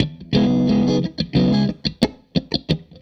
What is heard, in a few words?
Strat Guitar Loop